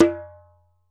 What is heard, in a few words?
ASHIKO, African Perc